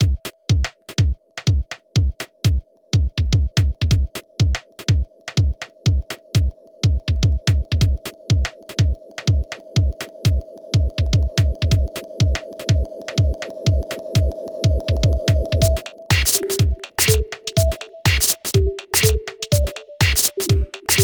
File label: Happy bells